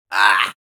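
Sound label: Demoman pain sharp, Spanish; (Gruñido 4)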